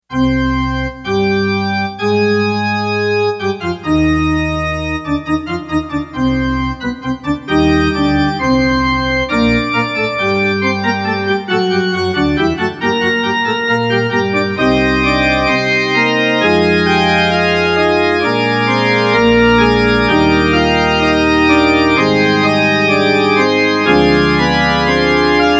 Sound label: church organ